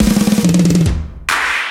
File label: Fills, Loops 140 BPM